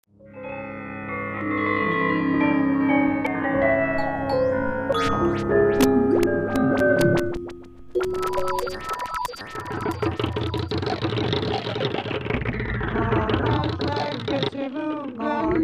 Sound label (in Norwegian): musikk mix; skranevatnet